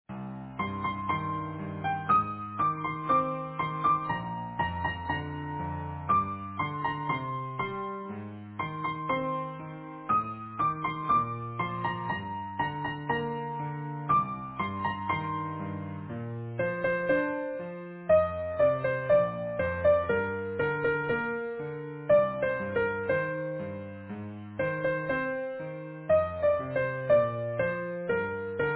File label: Easy Piano